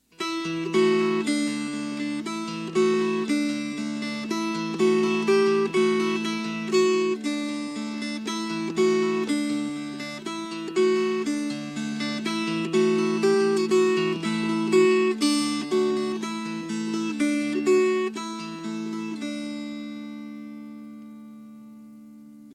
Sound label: Fingerpicked